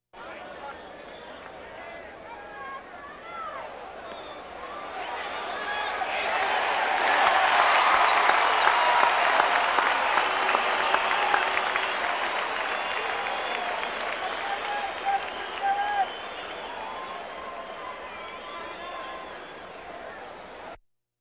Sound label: Crowds Race cars Baseball